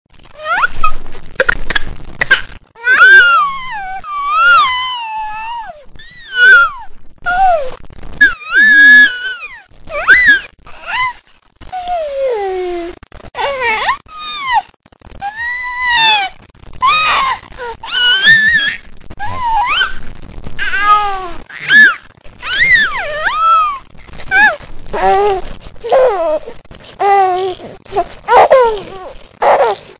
spielen; Weihnachten

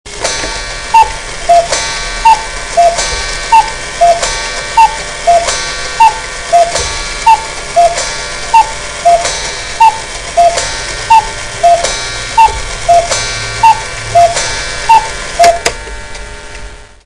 Kuckkuck Ahorn, Grossuhren

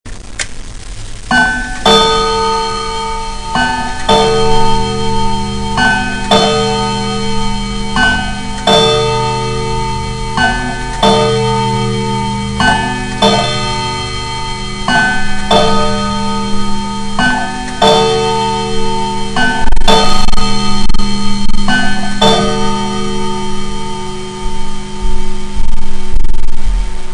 Regulateur, Grossuhren

